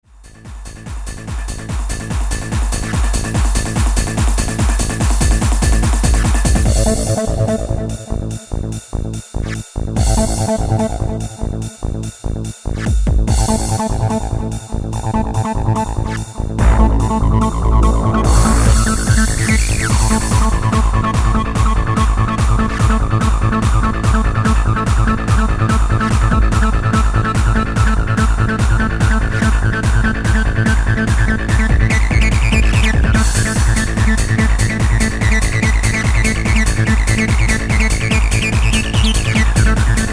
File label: 303 acid